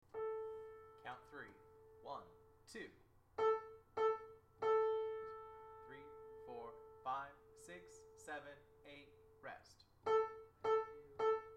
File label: Hall Alto, hallelujah chorus